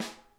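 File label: center, snare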